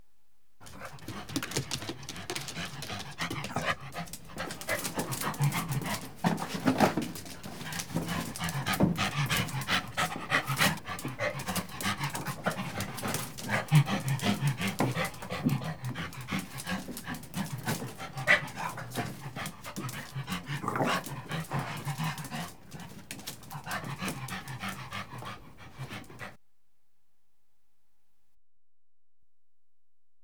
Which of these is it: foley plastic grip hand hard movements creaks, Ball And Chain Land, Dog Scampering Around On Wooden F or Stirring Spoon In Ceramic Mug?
Dog Scampering Around On Wooden F